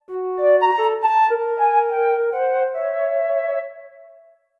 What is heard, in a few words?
flute